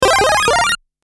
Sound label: Game, transition